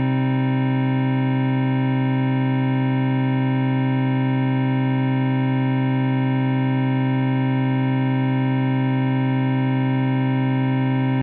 cm chord, Omnichord; OmnichordGodot

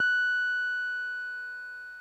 ebm, Omnichord

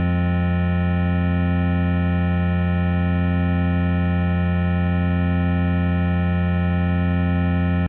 fm chord, Omnichord; OmnichordGodot

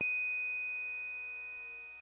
ebm, Omnichord; OmnichordGodot

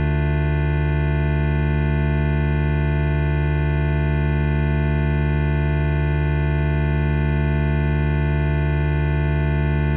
dm chord, Omnichord; OmnichordGodot

